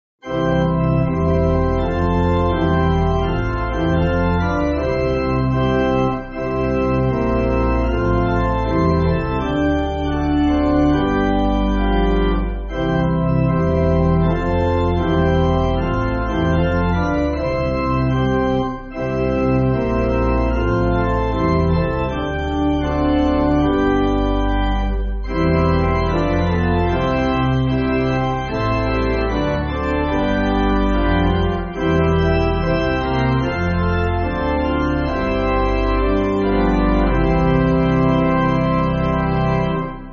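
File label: Pipe, Music Backing Files for Churches; Hallelujah Beautiful Morning; Webbe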